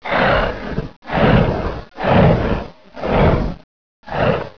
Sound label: bullchicken idle